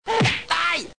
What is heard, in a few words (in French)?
Baffe Aille; SouthPark